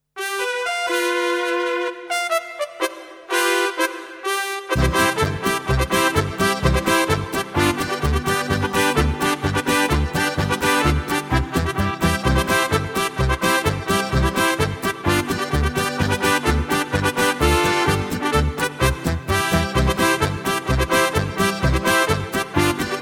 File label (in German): trompetenecho